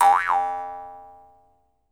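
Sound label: Jaw Harps, Pitched Percussion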